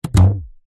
warfare bow release pluck

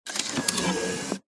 technology video game console disc eject; nintendo wii

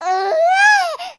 human baby 3 months upset single cry; sound effects one

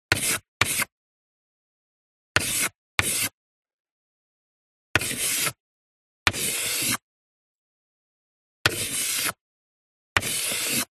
Handwriting Chalk Short; D&H; soundbox library

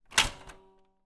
office printer paper compartment door open

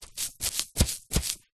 office sponge brush wet paint dab on paper; zapsplat

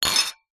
wine glasses x3 pick up one handed clink

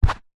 sport rugby ball down score try

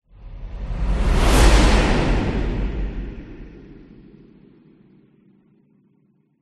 whoosh; orlando lanzini

